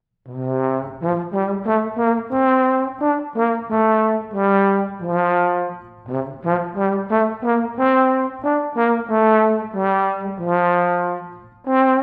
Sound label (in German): Posaune